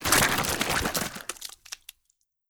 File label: Corpses gore